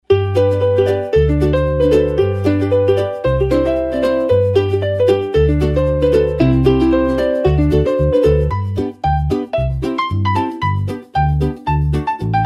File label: ukelele